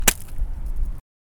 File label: Fruit Squish